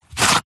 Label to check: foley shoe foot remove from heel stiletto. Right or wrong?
Right